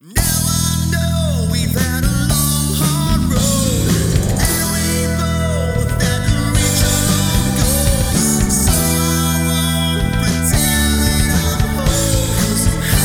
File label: male keyboards bass; music smorgasbord